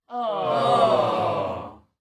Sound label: Voice Crowd Small Expression Awww Stereo